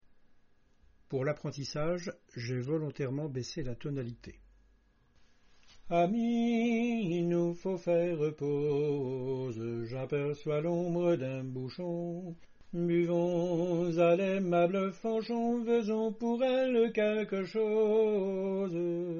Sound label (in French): tenor; fanchon